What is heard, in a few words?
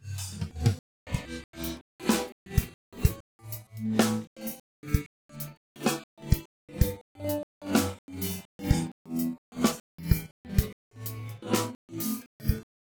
drums; sound source separation methods; ADRess